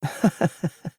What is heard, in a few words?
laugh; taichi